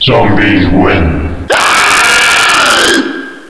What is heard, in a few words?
zombie win; Reega kz